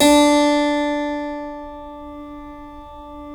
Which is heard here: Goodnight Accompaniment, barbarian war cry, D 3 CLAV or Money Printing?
D 3 CLAV